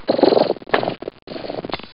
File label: Spider